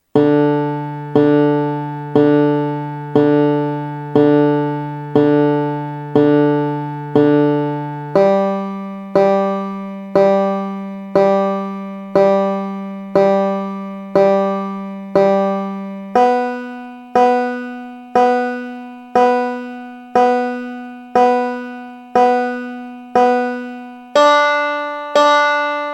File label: Banjo D G B D